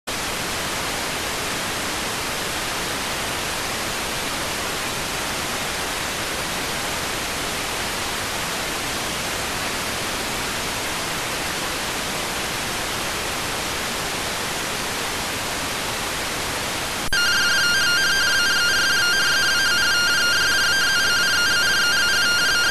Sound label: rtty rx